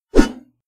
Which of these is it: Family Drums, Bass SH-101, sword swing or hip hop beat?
sword swing